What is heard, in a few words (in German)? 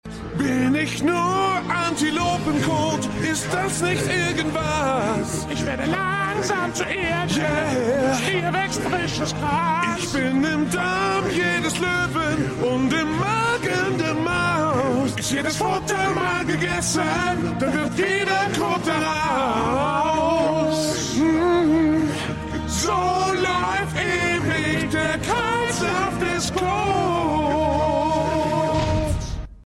Gesang; PICKET FENCES - TATORT GARTENZAUN; Cornwallis